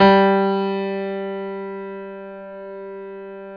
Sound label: Piano G2